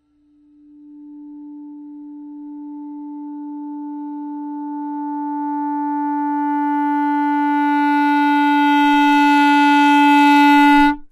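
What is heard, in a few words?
clarinet crescendo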